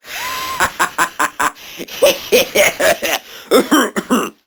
Barks Arcade Machine; Warframe; Mall

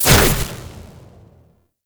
Amp Chassis Fire; Warframe; Weapons; Operators; Amplifiers; Corp Amp Set One

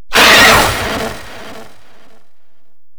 Golem Pain; Enemies; Warframe

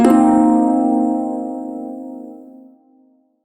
blues chord, shawzin; Warframe

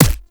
Medic Drone Shield Hit Notification; Abilities; Warframe; Tau; Dax Medic

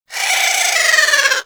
Avichaea Vocal Idle; Hunting Critters

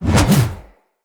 Spear Spin Whoosh; Weapons; Enemy; Nimrod Spear

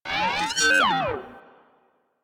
Leech Attack Spotted, Dialog; Leech Osprey

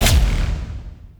Pod Shotgun Proj Die

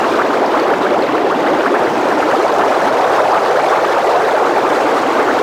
Ocean Underwater Geyser Jet Loop; Warframe; Ambience; Grineer Ocean

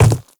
Quadruped Medium Walk Default, Footsteps; Warframe; Infested

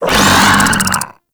Desert Skate Vocal Attack; Warframe

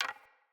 Hunter Bow Wood Rattle; Weapons; Player; Envoy; Bows

